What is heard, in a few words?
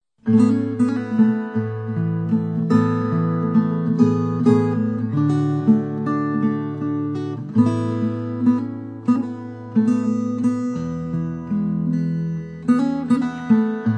Choir Medley Parlor Guitar